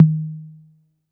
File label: B2 Analog Tom, Yamaha PSR-E323; Analog Kit